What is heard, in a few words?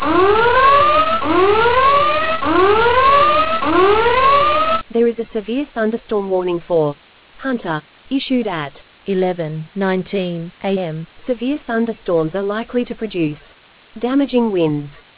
storm audio